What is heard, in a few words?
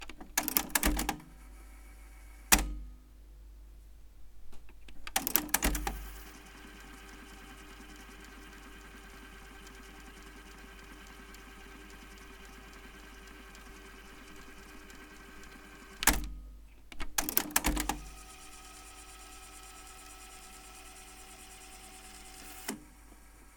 Tape Player Rewind